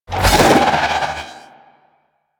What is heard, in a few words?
Trooper Melee Spin; Warframe; DE; Sentients